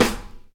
snare sound c sharp key, Loudest frequency: 1441Hz; hits one shots